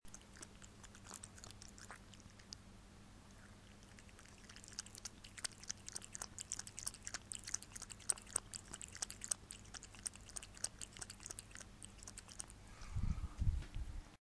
drinking water from a glass; elvis

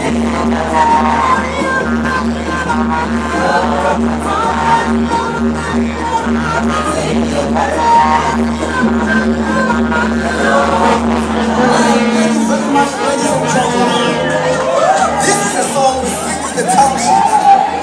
long street during wc2010draw

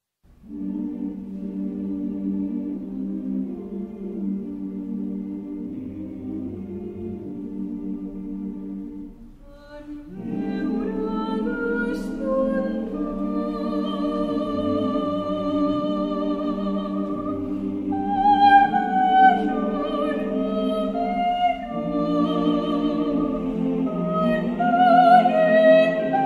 catalan song of the birds; orfeo catala

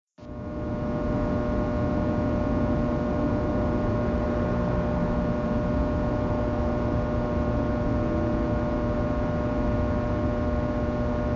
empty hospital drone

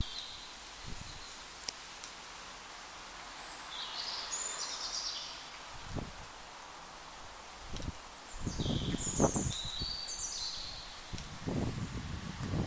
birdsong in a multistorey carpark

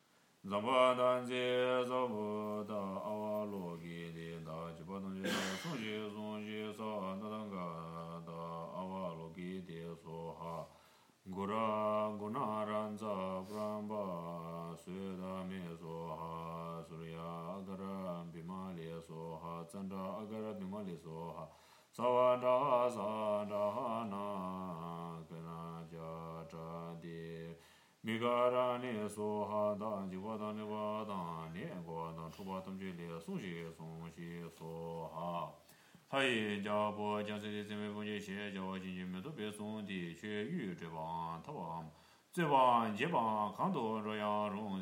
tibetan puja verses

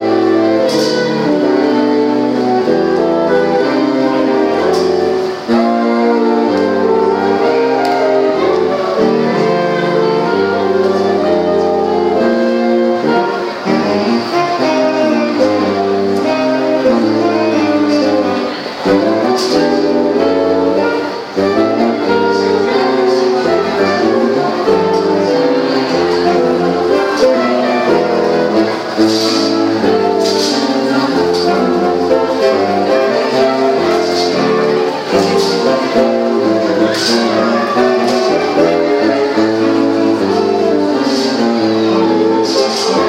saxophones in civic

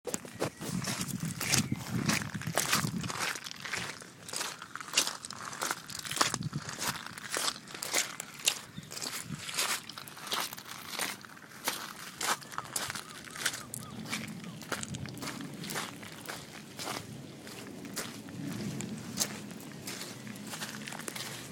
along the river at low tide walk; walk 7 richmond lock to kew bridge

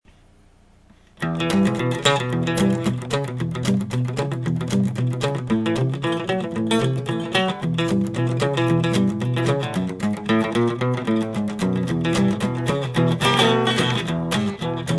blues shuffle

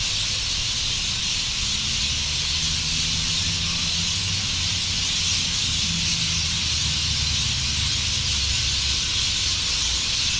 seriously noisy tweeting

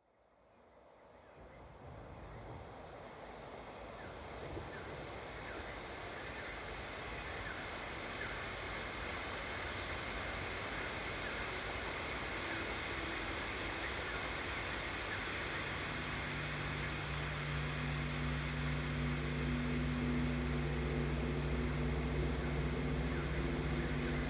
cicadas

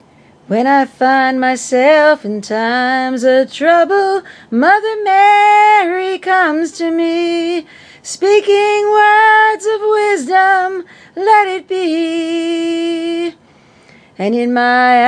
singing

